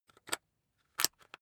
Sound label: rock drill head attach regular; maschinen; bohrer; soundpool